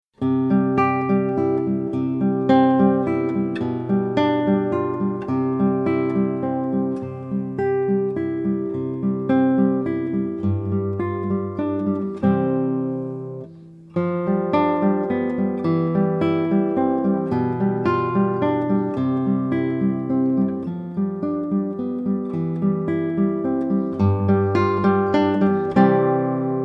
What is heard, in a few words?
waltz, guitar; carulli